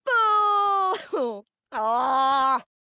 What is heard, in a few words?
Woman Booing; Zomboid; Lifestyle